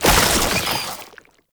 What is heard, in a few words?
Underground Attack Tentacle Burrow; Warframe; Abilities